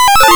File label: PHONE RING; Big Beat Samples & More; AKAI; ALARM KIT